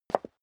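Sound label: step high heels concrete single stair down, footsteps; schritte; menschen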